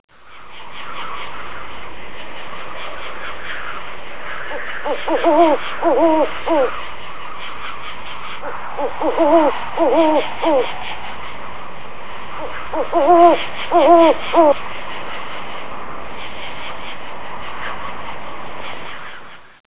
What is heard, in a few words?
owl hoot